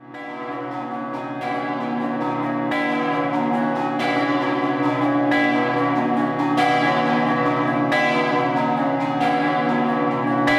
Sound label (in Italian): Venzone Scampanottata, campane